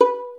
Violin Pizz B 3; Instruments & Percussions; InVision Interactive; Lightware VOL-1

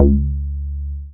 BAS SH-101; Best Service Gigapack 2

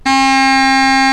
a#4-d#5, Krumhorn

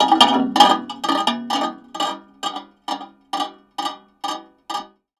Raw, Tin Of Guitar Strings, contact mic

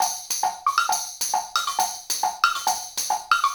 Tambourine and Wood Block; Combo

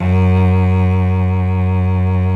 SOLO CELLO

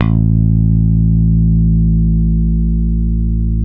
PICK A 2, Bass, ROCK PICK; Spectrasonics; Bass Legends

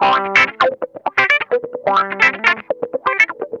WASPISH, Funk Guitar; Zero G - Funk Guitar

